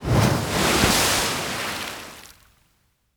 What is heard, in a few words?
geyser fountain